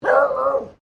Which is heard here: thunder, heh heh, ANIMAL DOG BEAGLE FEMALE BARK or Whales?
ANIMAL DOG BEAGLE FEMALE BARK